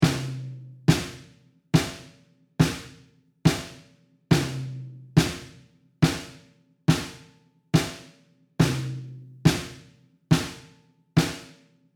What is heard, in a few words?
Snare close and overheads; Drum Miking Examples